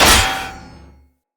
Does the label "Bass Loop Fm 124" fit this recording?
No